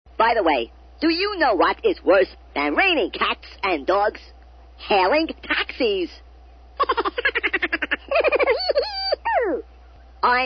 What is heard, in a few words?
taxi